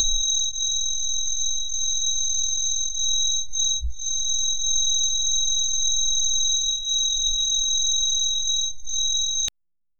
ting ting tingting ting; distant

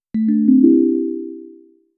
design a soft melodic not, interface

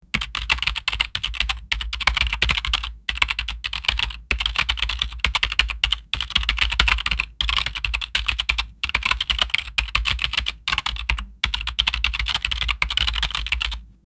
a thocky keyboard typing, keyboard

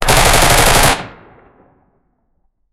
a gun shooting sound

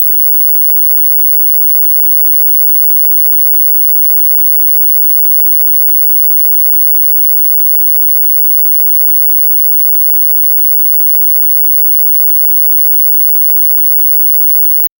tv or electrical horrible

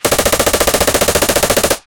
rotatory cannon very fast, machine gun

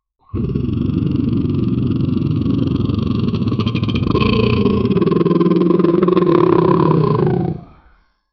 tyrannosaurus roaring; dinosaur

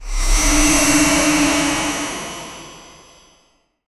a shadow magic spell soun

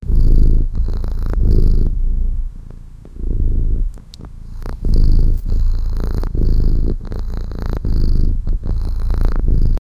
sweet kitten meowing soft; feline

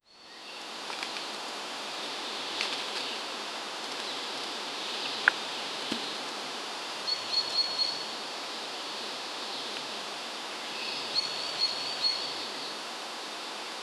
cinematic autumn garden, leaves falling

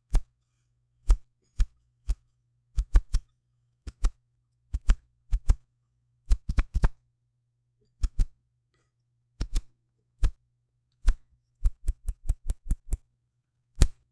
sound of a handshake

